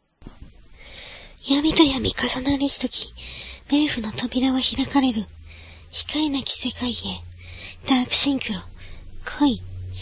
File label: dark synchro